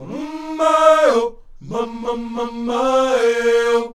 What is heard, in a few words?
UM MAY, Afro Chants; Roland LCDP11 Africa VOL-1; Um May-O